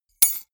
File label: key ring large drop stone hard